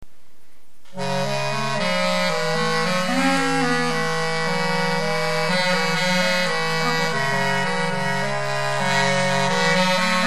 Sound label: Hypolydian, aulos